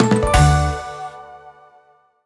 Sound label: small Win; fruit shop